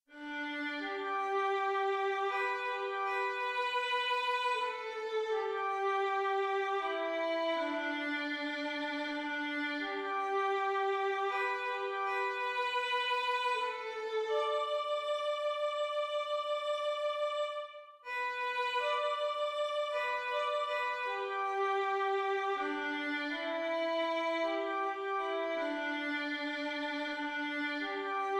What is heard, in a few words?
amazing grace vln